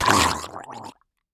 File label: drowned death